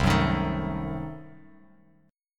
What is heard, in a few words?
C-Diminished 7th, Bass Standard, chord; strummed; JGuitar